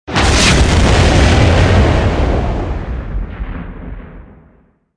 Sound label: med explo; REM-Freelancer; REM-Mod